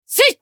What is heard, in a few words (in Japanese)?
攻撃短; 迅雷のアドリアナ